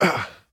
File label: hurt; STALKER; IWP